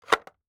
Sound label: Insert Cassette